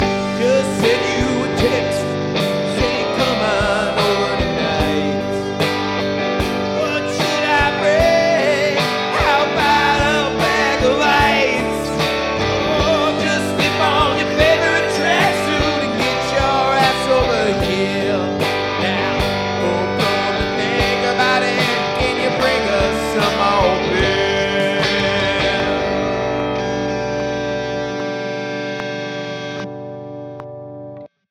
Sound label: Grunge